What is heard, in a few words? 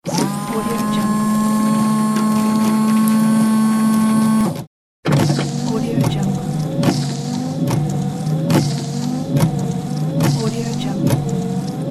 car wipers